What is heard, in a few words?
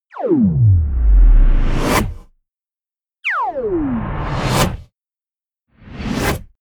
disintegrate